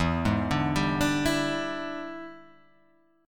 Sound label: E 7th Sharp 9th Guitar Open G, chord